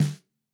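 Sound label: SNARE HIGH HIGH SNARE, analog recorded drum; snare 2; Sonimus; n series drum pack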